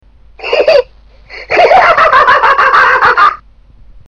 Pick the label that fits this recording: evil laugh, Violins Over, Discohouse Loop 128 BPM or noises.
evil laugh